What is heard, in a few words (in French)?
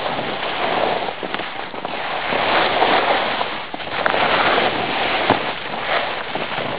glisse; Freeride